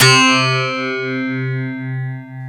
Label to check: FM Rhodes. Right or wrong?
Right